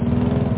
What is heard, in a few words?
Mowing; Ultimate Games No. 2; Amiga-CD32-CDTV; breaking the lawn